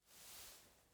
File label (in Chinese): 爬行